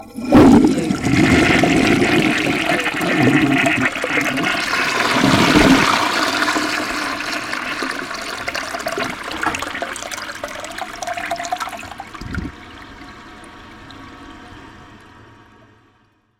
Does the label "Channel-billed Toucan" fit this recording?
No